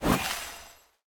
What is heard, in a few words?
p atk pickxe